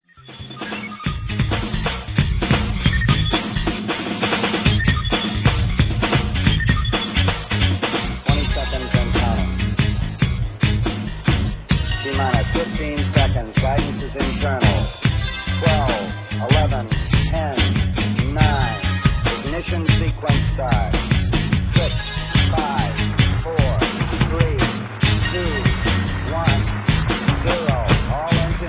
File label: drum solo space